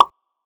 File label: Water Drop